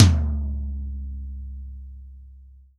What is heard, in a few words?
REAL DRUMS POP KIT